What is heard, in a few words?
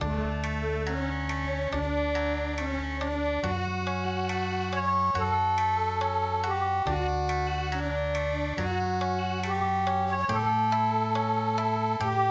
Okinawa; Arminginuuta